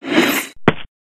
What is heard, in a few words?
decapitation head fall off